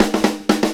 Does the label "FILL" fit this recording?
Yes